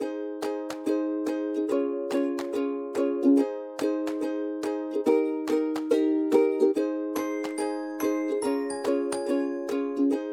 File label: music cheerful; bgm